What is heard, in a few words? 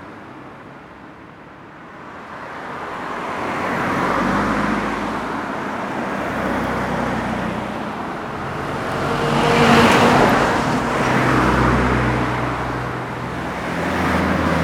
Traffic; 環境音